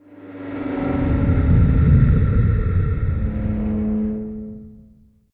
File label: Ambient